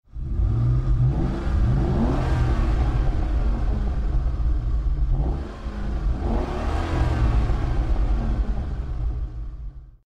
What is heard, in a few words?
rev